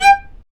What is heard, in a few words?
Chamber Marc; Roland L-CD702; Combos 4